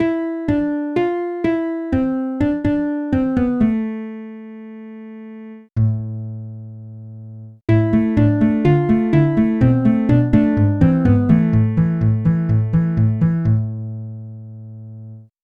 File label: acoustic bass